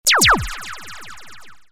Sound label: stinger